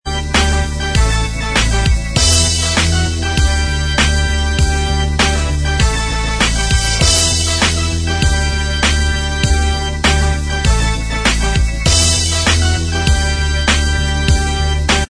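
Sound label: instrumental track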